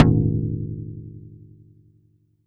Tom

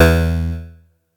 atari 520 st

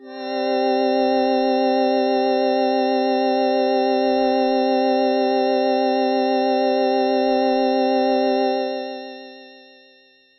PAD Ambient Glass Padz

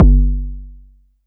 BD

